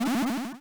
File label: magic ball; dot adventure